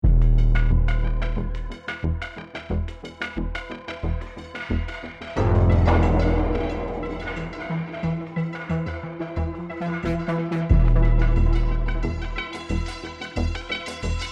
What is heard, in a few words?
bomb planted